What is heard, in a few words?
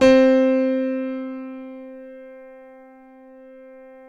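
C3 piano; Northstar - New Gold VOL-2; BOS.GRAND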